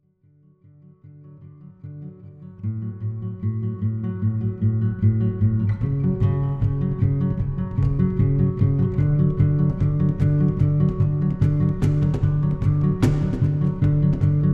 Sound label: Anti Folk